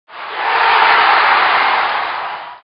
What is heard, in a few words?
cheer